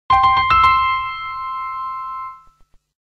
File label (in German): Jingle; Deutsche Telekom